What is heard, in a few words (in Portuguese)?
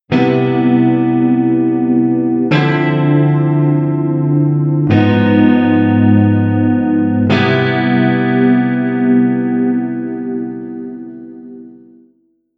Cadencia C7M Dm79 Abm6 C7M